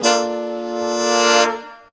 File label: brs f, brass; house; PS-28 Vocal House Samples